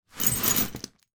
game metal playing pieces scoop or pick up from carpet; monopoly